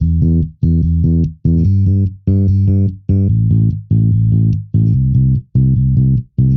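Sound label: Bass 1 Alternative E minor 120bpm; Guitar and Bass; Ableton; Factory Packs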